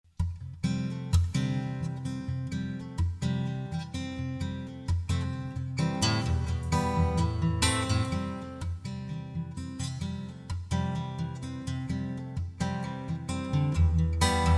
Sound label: Acoustic Rock Track